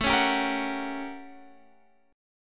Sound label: A 13th Flat 9th A, guitar chord